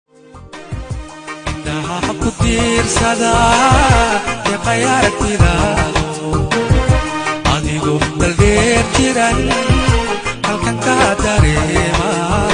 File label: Arabic Ringtone